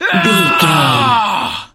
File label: voice male battle shout